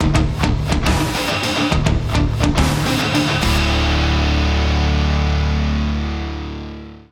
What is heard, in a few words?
Bounty Fail Cue; Cues; Warframe; Dynamic Music